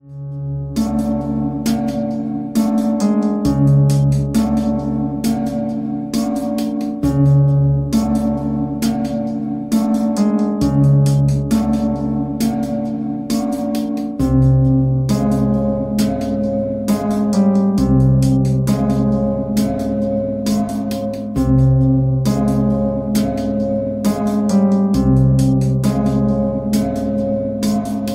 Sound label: Cartoon Ringtone; Mob Psycho 100